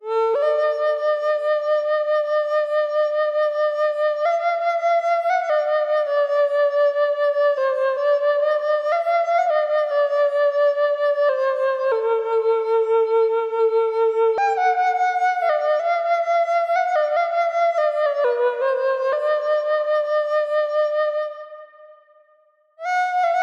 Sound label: isfahan synth